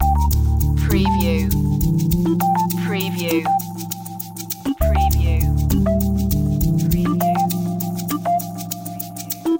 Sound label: chilled tones loop